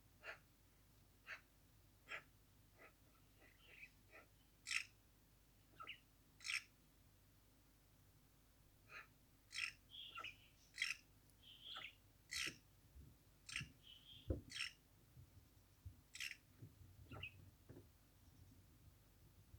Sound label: magpies